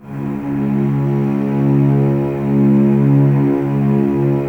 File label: Cellos C#2, String Ensembles, No Vib; Miroslav Vitous